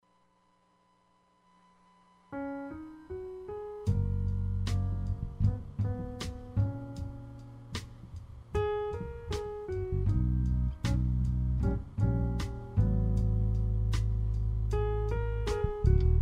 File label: jazz